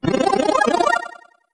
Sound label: Pipe Appear; SM64